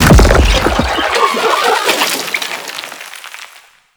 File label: Octopede Unearth Throw; Warframe; ManInTheWall